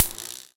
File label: zap